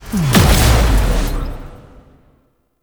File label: Exalted Sniper Cast Impact; Frumentarius